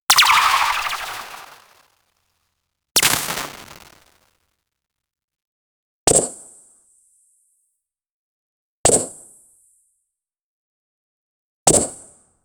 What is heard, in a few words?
soundpack of insect unit, attack